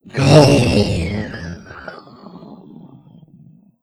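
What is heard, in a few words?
die, alien controller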